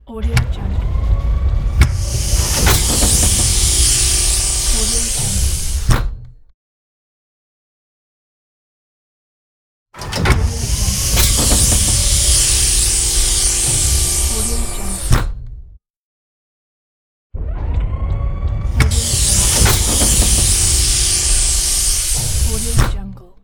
space ship airlock; space ship airlock pack

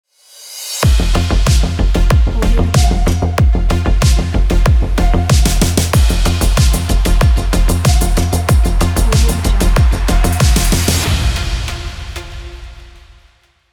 dark electro cyberpunk logo

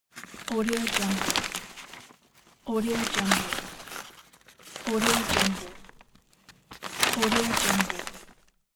newspaper

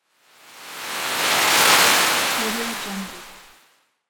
swoosh reactive

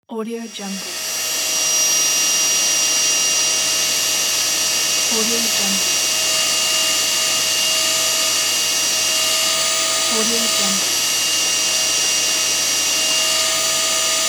vacuum cleaner

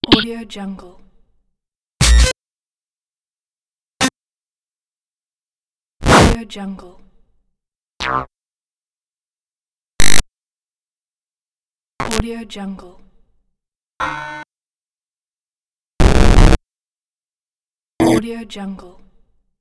glitch

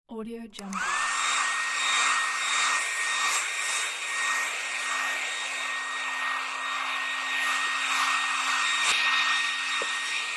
hair dryer

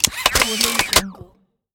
the robot mechanism

